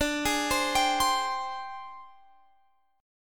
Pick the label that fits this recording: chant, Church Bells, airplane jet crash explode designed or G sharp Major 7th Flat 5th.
G sharp Major 7th Flat 5th